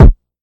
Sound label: kick 808